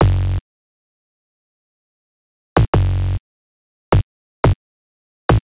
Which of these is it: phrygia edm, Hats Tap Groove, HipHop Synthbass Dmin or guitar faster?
HipHop Synthbass Dmin